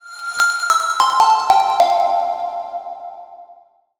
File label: Iridescent Melodic Fill 150 F; embers